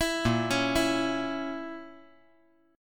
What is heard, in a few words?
A sharp Diminished, chord; A#dim arpeggiated; JGuitar